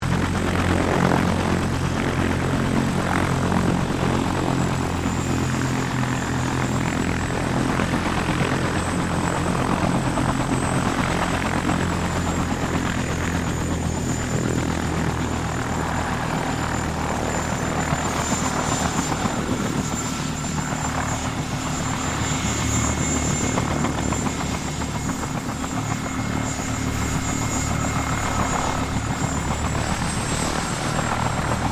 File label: HELICOPTER 1967 BELL UH1 IROQUIOS PREFLIGHT